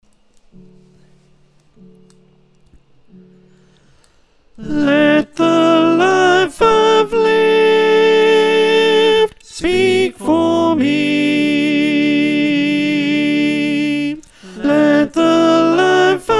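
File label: soprano, choir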